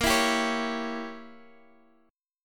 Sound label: Bb Diminished 7th Bb down Guitar Half Step Down